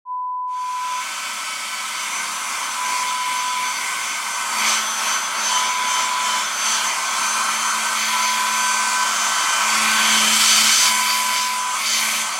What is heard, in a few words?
Hair Dryer sound